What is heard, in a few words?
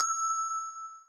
bell